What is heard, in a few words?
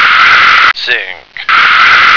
sink